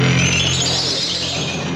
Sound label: Wobbly; Horror Express